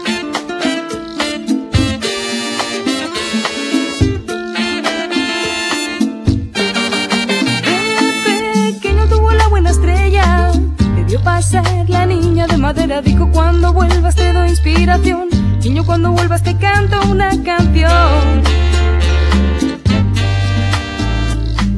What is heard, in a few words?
Flamenco Chill